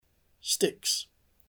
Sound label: sticks